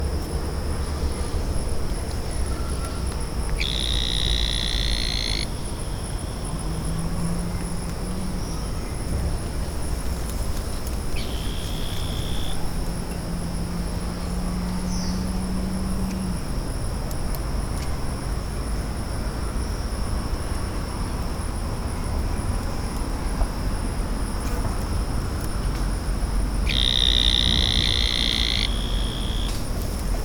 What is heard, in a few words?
D truncatus, Dart Frog Genus Dendrobates; TRUNCATUS (YELLOW-STRIPED POISON FROG)